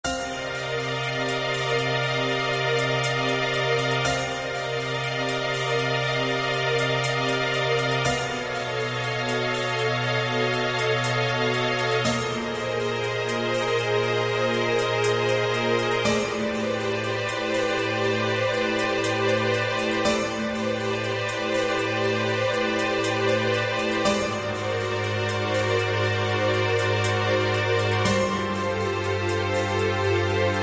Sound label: Piano Cm BPM060